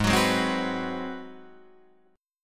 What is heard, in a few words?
Ab 7th Sharp 9th Guitar Open D, chord